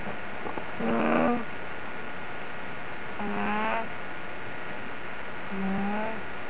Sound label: hum